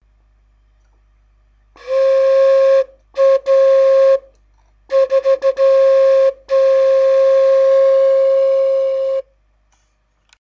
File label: flute, orgue; premire flute